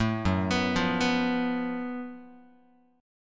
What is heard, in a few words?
Gb Minor Major 7th Double Flat 5th Gb Guitar; arpeggiated; 0,2,2,3,3,x; JGuitar